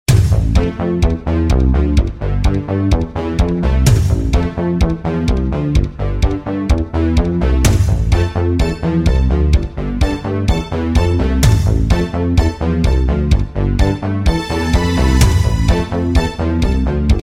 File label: 80s English Rose Dreams